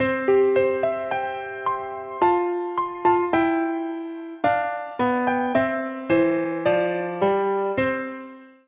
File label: ringer; Ringtones